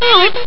alien; arcade smash hits

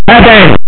zzz

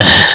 laugh; beavis and butt head

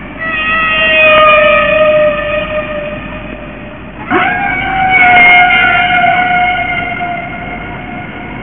splash screen dolphin; ecco the dolphin

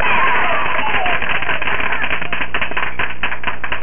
cheer and applause

